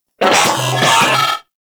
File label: Moa C Vocal Enemy Spotted; Warframe